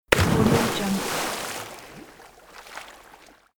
jumping and diving into the water; kaelv

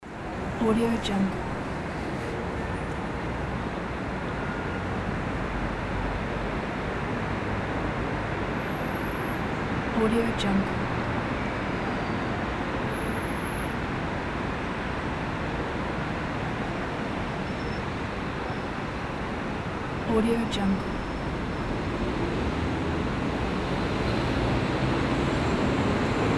urban ambience

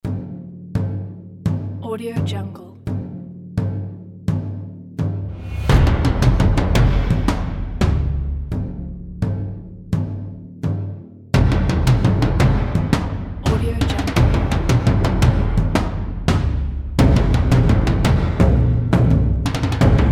percussion

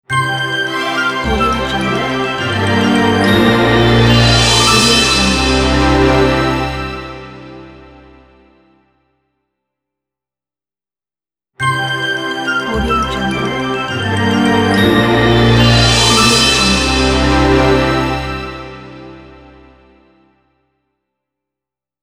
inspiring orchestra logo

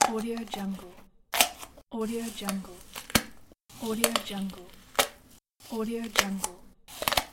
biting off an apple

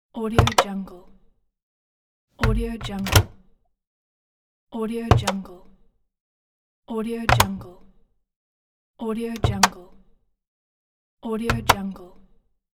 washing machine door open and close; sounddivision

